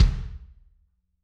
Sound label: Kick Hard, Drums